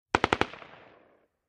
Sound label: M-16 Short Barrel Machine Gun Burst From Distant Point of View